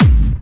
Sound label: BD Clubby, kicks; VEC1 Clubby